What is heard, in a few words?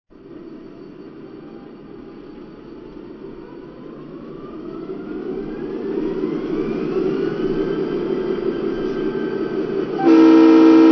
EMD GP20